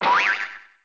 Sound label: jump; sm64; moneybags